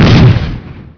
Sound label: shock fire